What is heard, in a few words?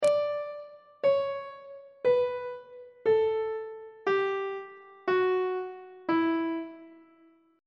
f flat dorian mode descending on treble clef